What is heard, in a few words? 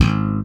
SPECTOR PULL G#1, Bass; Sampleheads; Will Lee Bass Library